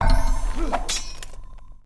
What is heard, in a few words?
Build Roman Praetorian; Civ4 warlords